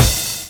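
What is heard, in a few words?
drum, GROOVES; AMG Gota Yashiki Groove Activator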